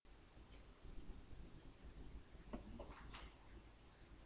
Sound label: deer